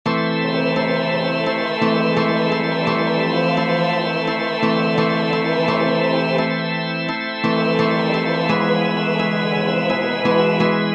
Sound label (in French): tango corse